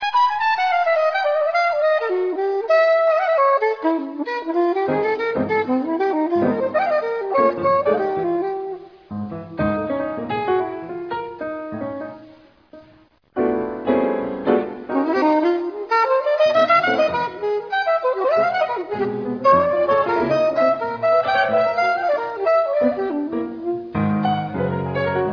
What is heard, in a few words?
pulse